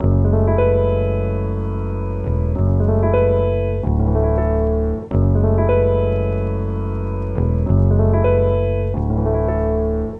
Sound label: 94 BPM F# Min; Gems Vol 9; Cymatics; Gems Free Teaser Pack